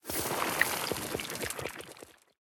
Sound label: step; smite; poseidon